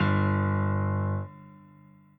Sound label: chord in g